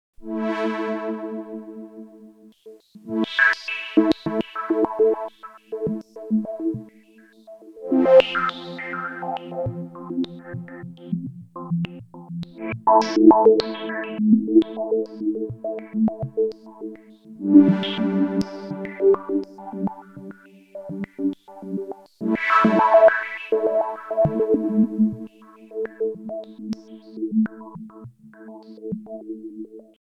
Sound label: Synth Sample and Hold; Earthquaker Devices Spatial Delivery V3